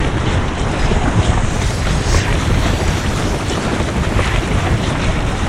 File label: lava loop; Quake 4 Alpha; environment lava